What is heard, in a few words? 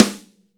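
snare drum, acoustic set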